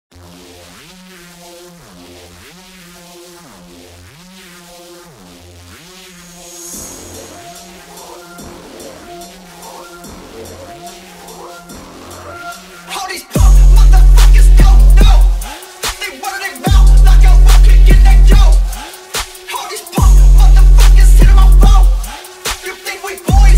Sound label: Bass Boosted